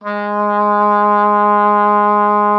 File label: G#3, OB D.AMORE; Xsample VOL-7